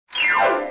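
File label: fast piano gliss down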